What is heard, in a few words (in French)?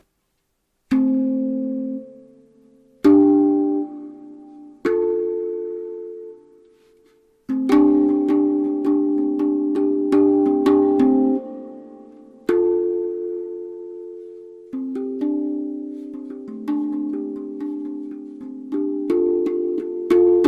Steel Tongue Drum 9 notes 38cm Re Kurd; Couleur Carbone